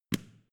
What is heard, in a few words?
switch small turn on hard